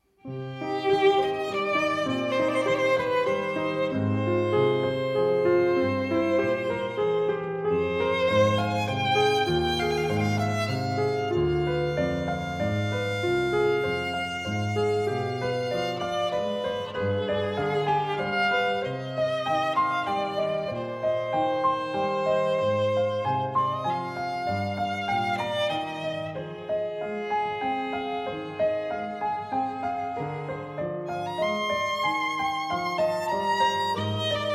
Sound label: Sonate Für Clavier Und Violine, Siciliano. Largo; Bach